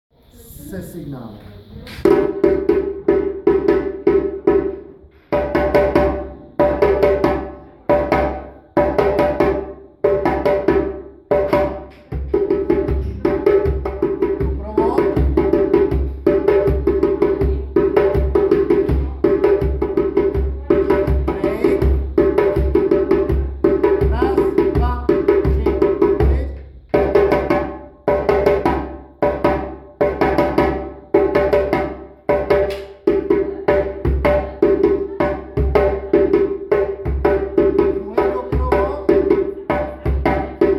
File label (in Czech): Bubny, djembe